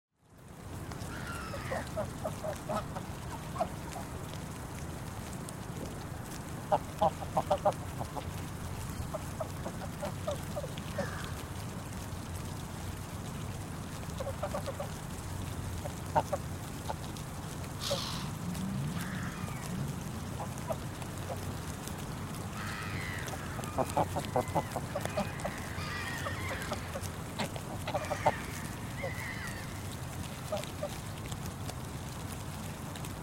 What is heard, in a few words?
geese eating the grass